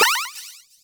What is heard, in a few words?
Snake Game Turn; Warframe; Nineteeen Ninety Nine